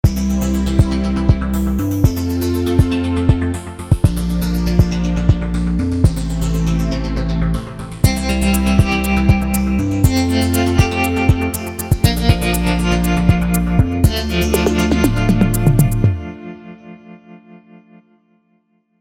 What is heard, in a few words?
Yamaha EX5R